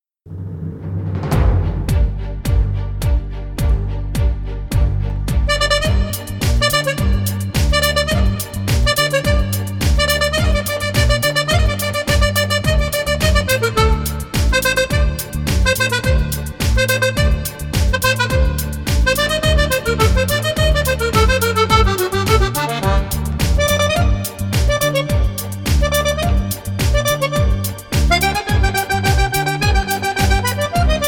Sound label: Cumbia; Rossini